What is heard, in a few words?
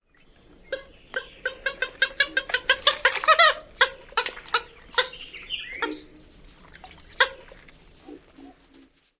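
purple gallinule typical calls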